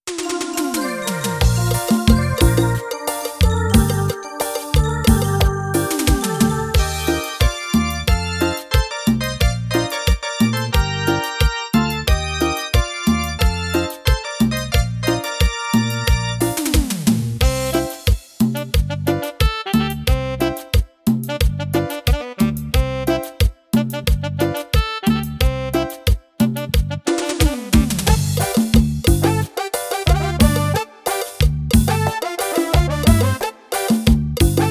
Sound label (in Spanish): Cumbia